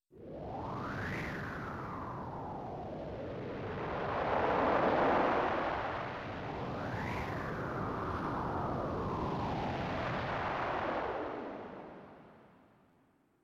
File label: wind